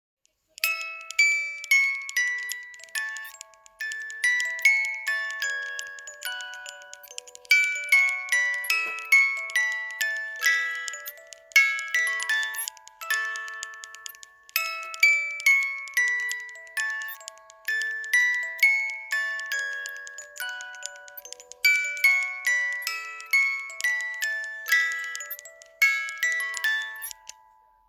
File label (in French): O solo mio, manivelle